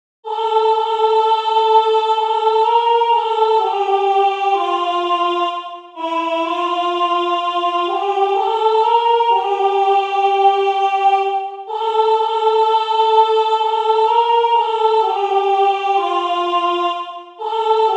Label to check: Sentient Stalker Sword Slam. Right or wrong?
Wrong